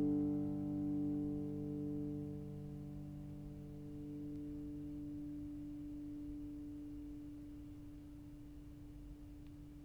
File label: D50 tail 12dB